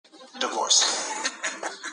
haha

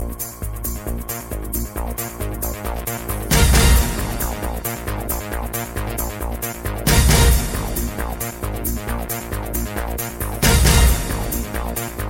Dance Beats

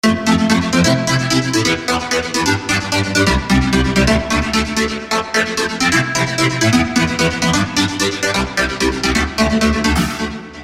Remix

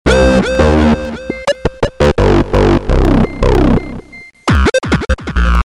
Messege Tone

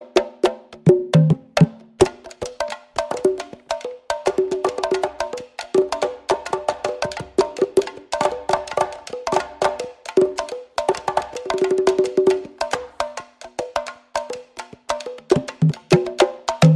igba drums

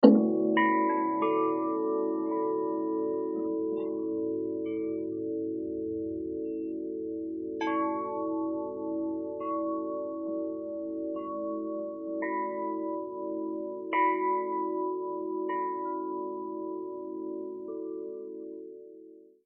Wind Chime Guitar

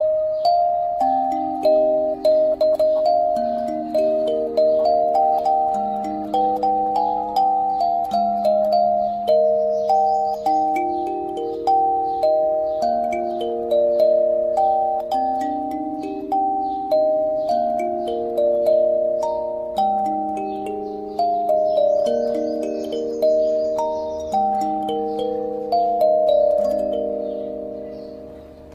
Spring day soothing

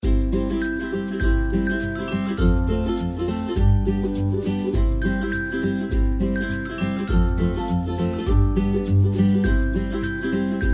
Upbeat

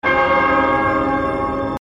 Bell; Hellraiser

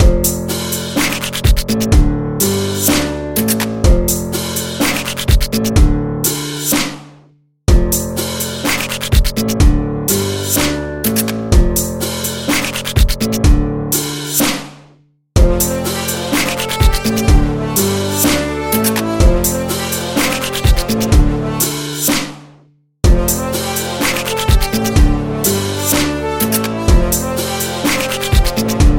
Beat

